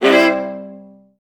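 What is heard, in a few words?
violas C3; Best Service; Peter Siedlaczek's Advanced Orchestra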